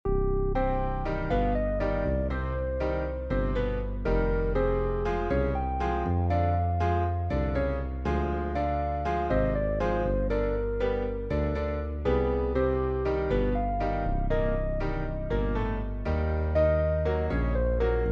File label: acc montunos; Musique Improvisation Piano Jazz; Montuno 2